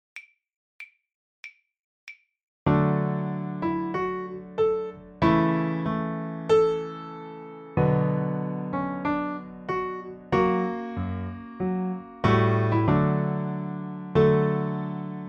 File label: D Major Scale, violin; The Violin Fun Book (Level 2)